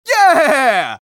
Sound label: scout triple jump; vo